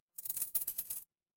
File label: impact coins